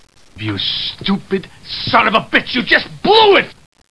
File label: sob